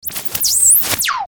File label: Tuner Static Frequency Sweep; Communication